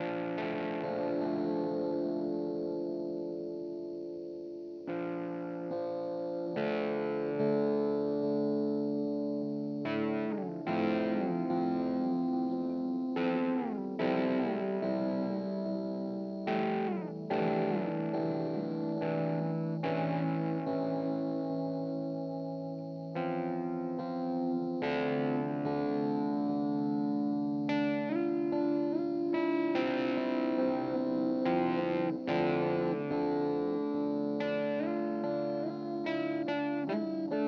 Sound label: Teisco